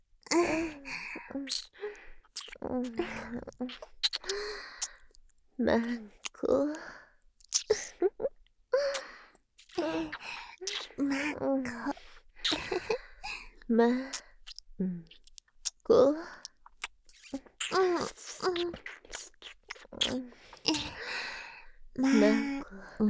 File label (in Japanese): キス; 文×由真; 左右反転版; L由真R文